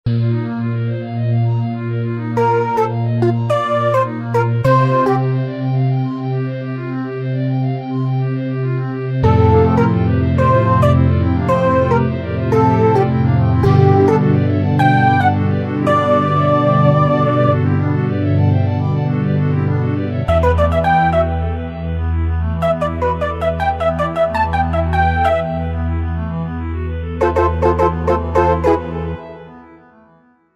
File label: Vox Arpeggios, Korg Wavestation